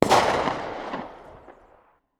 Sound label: hkp2000 distant; arccw go